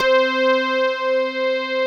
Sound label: HYBRID BASS; Greytsounds; Scott Peers Sound Engineering